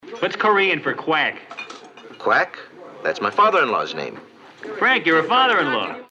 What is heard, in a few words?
quack